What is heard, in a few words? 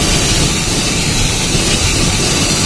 rocket steam; ambience